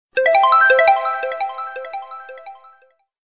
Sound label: count sms; ringtones